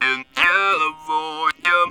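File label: "in California", Talk Box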